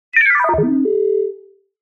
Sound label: Access Denied